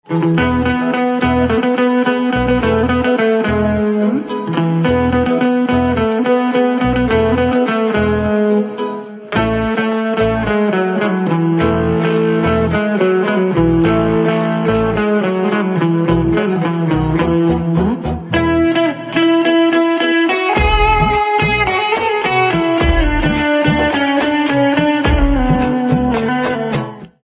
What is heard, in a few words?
Guitar Instrumental Ringtone